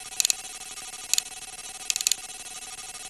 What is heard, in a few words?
hacking; phantom